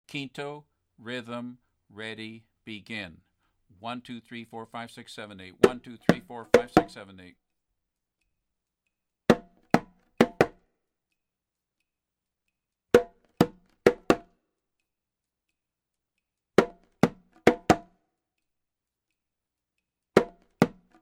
Rumba guancaro

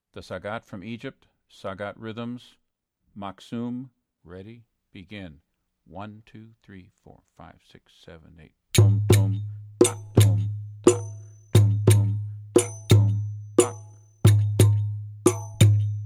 Maqsoum

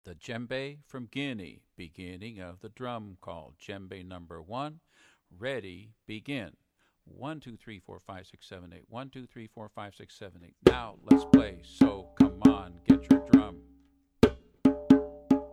Djembe Drum Call

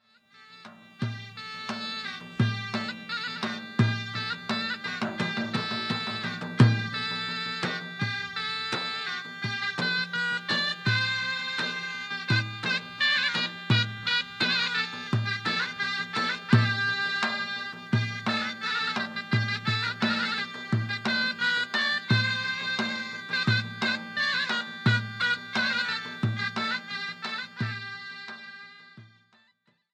Ghawazee dance

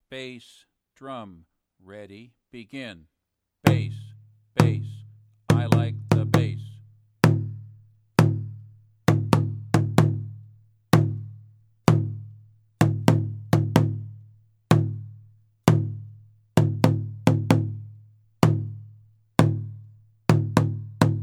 Aconcon bass

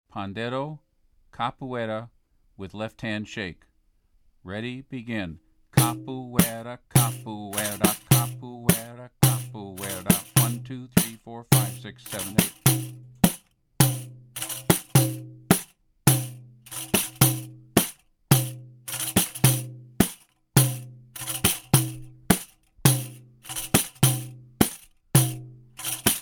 Capoeira With left hand shake

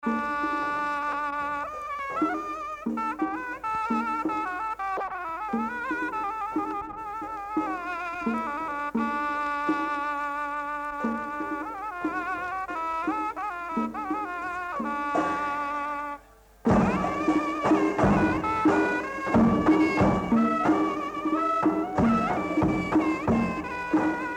Mehter military

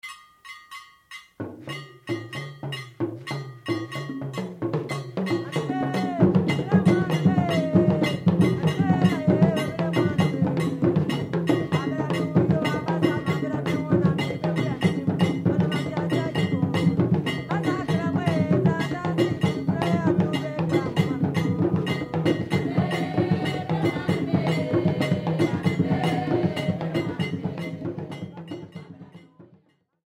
adowa; Dondo

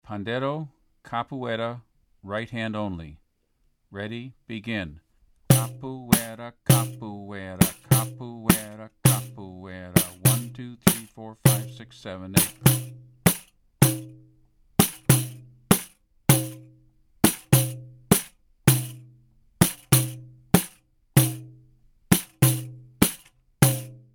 Capoeira Right hand only

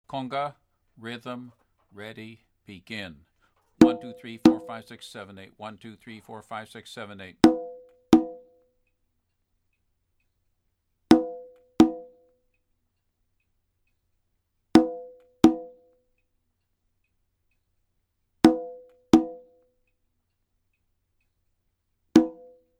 Rumba guancaro

